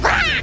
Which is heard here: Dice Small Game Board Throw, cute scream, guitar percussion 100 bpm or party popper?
cute scream